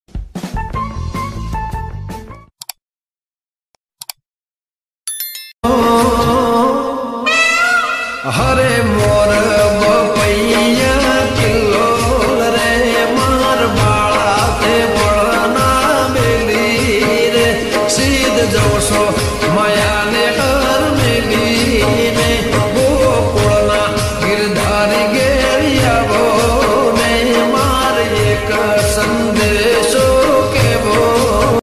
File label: gujarati ringtone